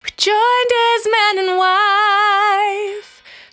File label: Cry 68bpm B, Vocal; Zero-G